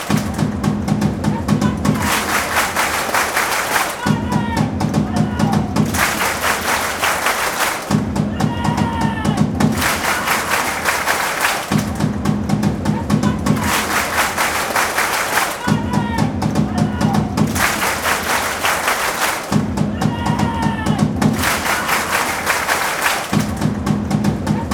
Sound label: claps; psg